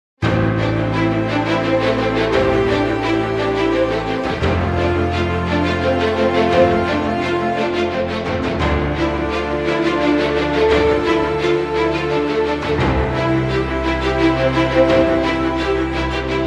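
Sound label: Cinematic Epic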